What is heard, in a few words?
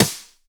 airy sizzle steel snare drum sample c key